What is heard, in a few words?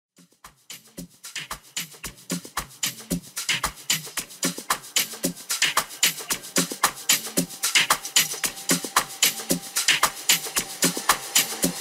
Amapiano Kitchen Unit Mix